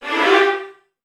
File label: VN FX, Virtuoso Strings